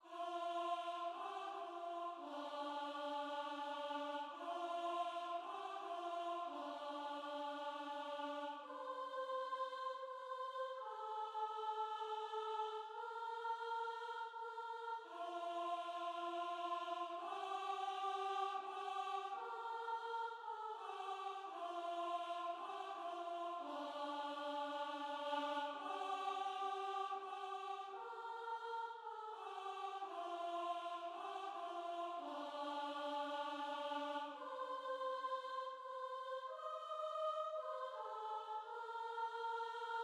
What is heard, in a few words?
Soprano; Silent Night